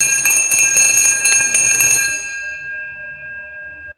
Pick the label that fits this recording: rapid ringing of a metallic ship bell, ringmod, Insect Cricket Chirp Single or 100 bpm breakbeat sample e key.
rapid ringing of a metallic ship bell